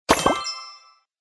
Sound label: Coin Animation, fishing; casino